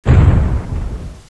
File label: Blast Small; GLScene; AirBlast